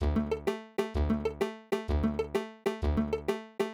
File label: village loop; Blazer; platformer game